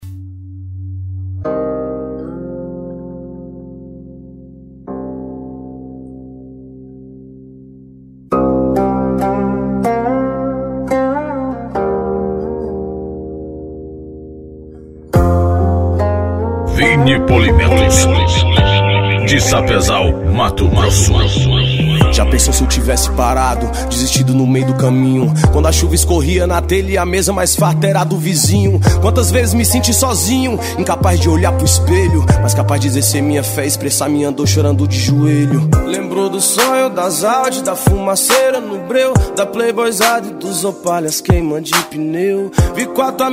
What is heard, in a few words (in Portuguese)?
funk e rap